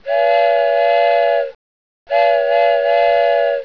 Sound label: whistle 4hole